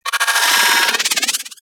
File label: Drone Vocal Player Spotted; Warframe; Venus; Venus Terra Attack Drone